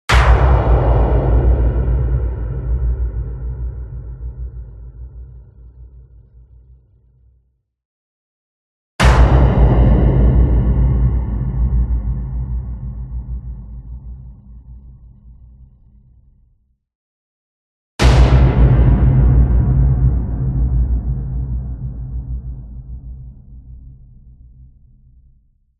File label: CINEMATIC HIT Fire Boom; smartsound